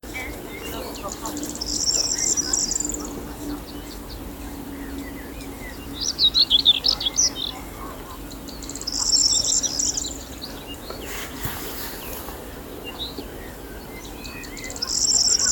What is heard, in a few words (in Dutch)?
Grauwe Gors, emberiza calandra